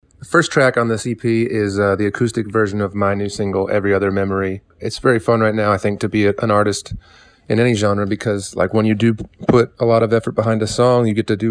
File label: Acoustic